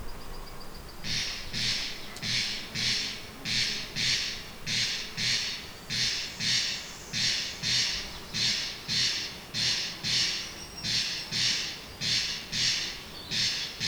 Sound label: Crex crex male